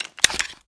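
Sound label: pistol reload empty mag out; reloads; gmod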